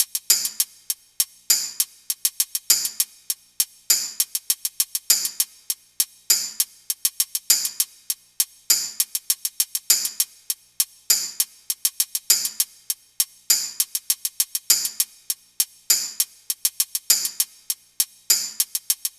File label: hat 100, Drum Loops, Hat Loops; SD MIDNIGHT Sample Pack; Slate